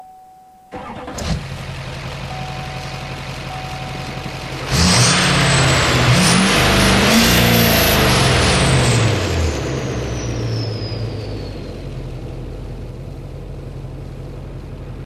start, vehicles cars h1; tdmcars

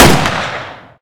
50cal fire; takedeppo

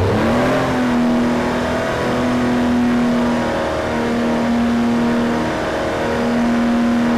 rev, vehicles cars; tdmcars; 507

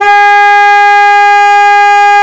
vc horn light, vehicles

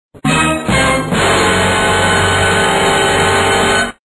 dundunduh, deathsounds

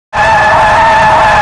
high skid, vehicles cars tires; tdmcars

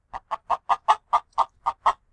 idle, chicken

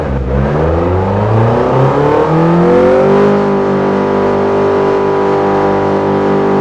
rev, vehicles cars; ceed; tdmcars

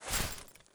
iron in, weapons; flood

